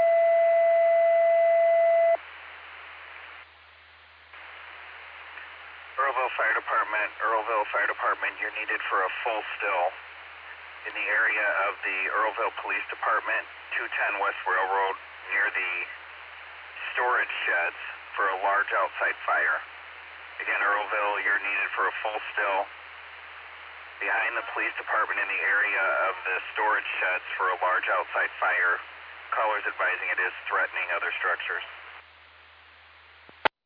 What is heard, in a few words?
Fire; Earlville; TTDAlerts